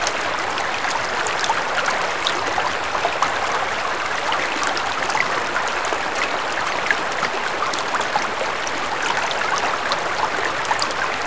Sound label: water stream slow; Call of Duty Modern Warfare